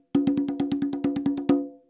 roulement, congas

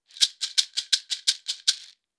Maracas prise manche

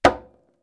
Congas claque plaque

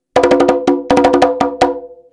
frise, congas

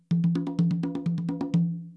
roulement, congas

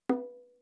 Bongo grave tonique

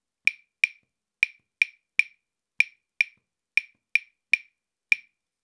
clave de rumba ternaire 3+2

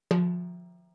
Timbales aigu peau

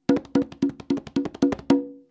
roulement ternaire, congas

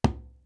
Congas basse

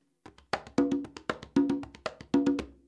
rumba ternaire, congas

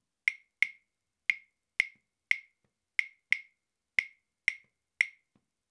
clave 3 de son 2+3

